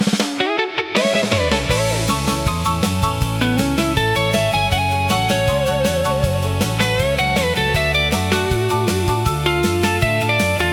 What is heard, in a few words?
instrumental